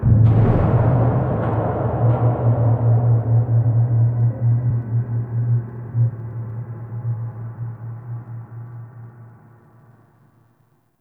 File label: Ship Descent Settle; Ships